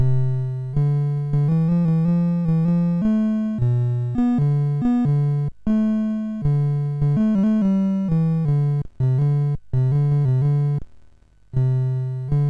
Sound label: MOS6581R3, sid; Paddingtons Garden Adventure